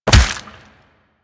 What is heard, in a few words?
Melee Fist Impact Flesh; Warframe; Weapons; Fists